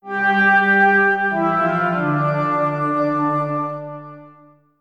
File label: Melody Hint; l ange et le demon cd i gamerip 1992